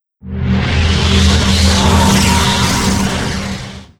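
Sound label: Skill Spirit bomb; Fly For Insanity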